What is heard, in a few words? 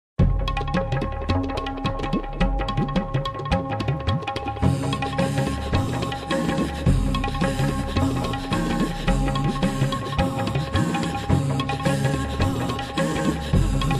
Tablas